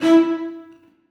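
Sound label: celli staccato d#2; Sonatina Symphonic Orchestra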